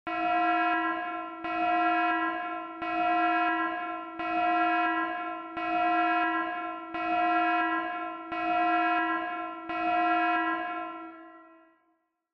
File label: Warning Siren Reverb Slow Long